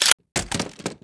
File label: p228 clip out, weapons; cstrike